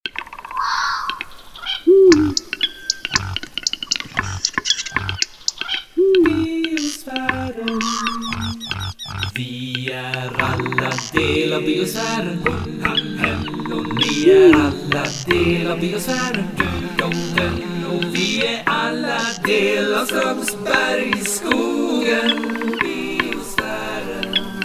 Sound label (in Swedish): jingel; Strömsbergsskogen